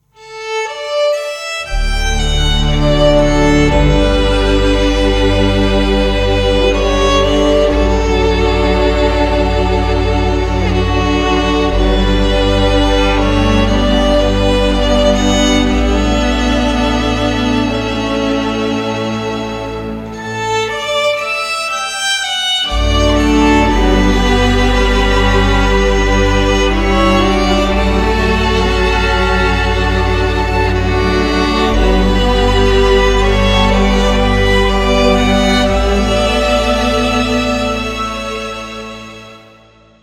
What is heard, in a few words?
violin romantic